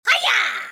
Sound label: monster click